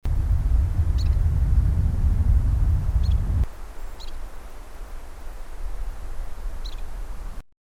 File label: ground squirrel